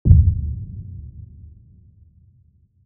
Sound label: Trailer Booms; EMP; Sfx